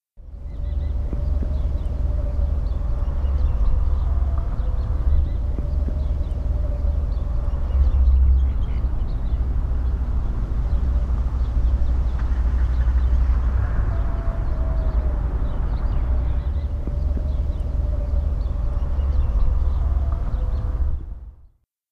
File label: merri whistling power; Provenance 2007